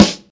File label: smooth snare sound g key; snare drum samples